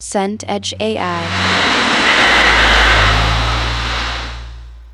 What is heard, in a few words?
car interior motorway